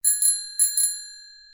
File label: bell